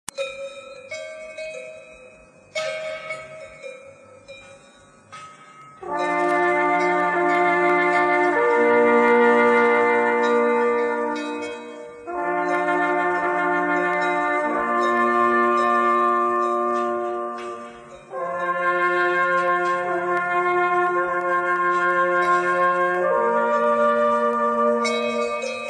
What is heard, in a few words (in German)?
Alphorn